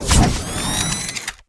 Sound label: Drone; rabbids multiverse; iOS, macOS, Mobile